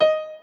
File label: Piano; Feel Demos; Snake